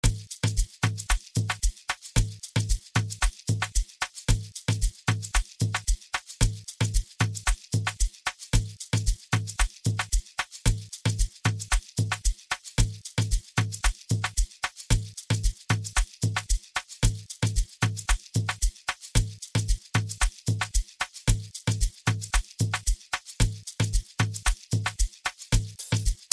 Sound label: vocal Mix